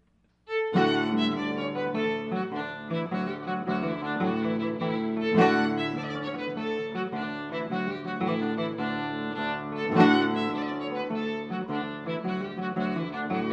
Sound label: irish jig